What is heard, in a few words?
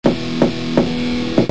knock